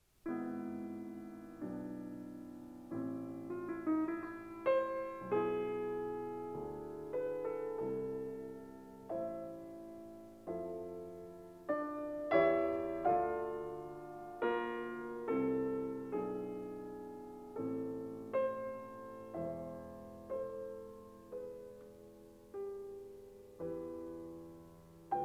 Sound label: Piano Sonata No. 28 in A major; Wilhelm Kempff; Beethoven; Piano Sonatas